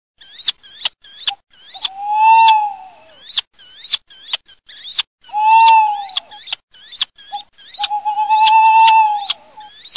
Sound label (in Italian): ALLOCCO CIVETTA